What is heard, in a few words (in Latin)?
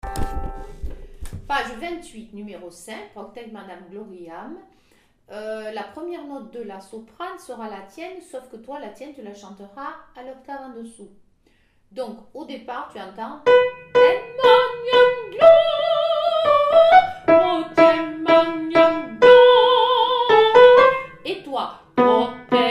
propter alti 2; gloria de vivaldi; Propter magnam